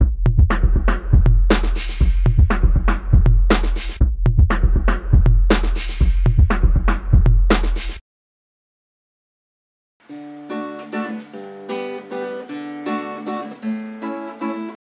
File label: Sample Beats